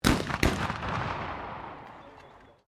MUSKET CAPLOCK FIRING DOUBLE, Black Powder firearms at a shooting range; Musket Caplock Unknown; Gun Shot; Gun Shots